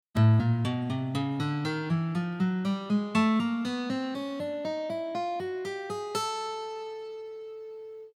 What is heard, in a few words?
12tet two octave G; ragajunglism